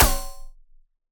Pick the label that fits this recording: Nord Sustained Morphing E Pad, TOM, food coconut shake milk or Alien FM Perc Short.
Alien FM Perc Short